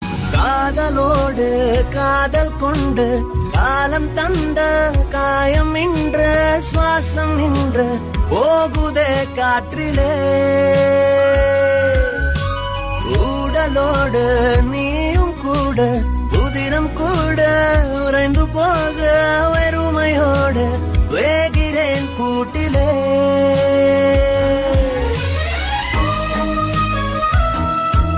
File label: sad emotional bgm; fidaa